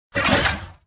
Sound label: Mvmt Servo Walk; AI Stalker; Titanfall 2